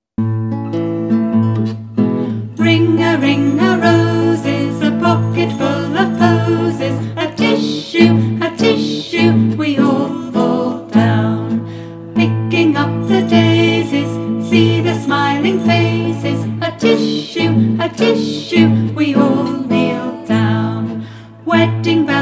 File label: ring o ring o roses